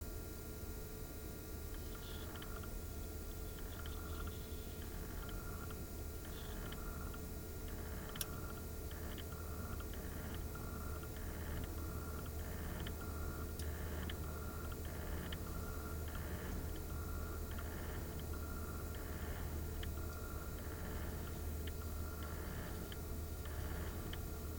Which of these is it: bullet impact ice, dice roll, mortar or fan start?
fan start